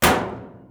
big click; NASCAR Thunder 2004

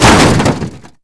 hit wall; NASCAR Thunder 2004

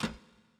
back go click; NASCAR Thunder 2004

